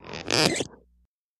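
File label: Creaky Squeak Small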